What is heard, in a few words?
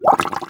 Cursor Bubble; Cursor Harvest Bubble